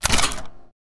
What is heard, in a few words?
layers mechanical; sinematic neon source; soundmorph